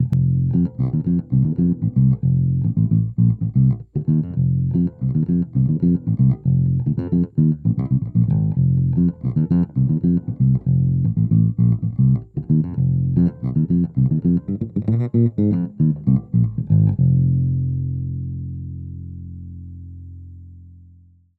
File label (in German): Phil Jones Double Four BG-75 test jazz bass gezupft mic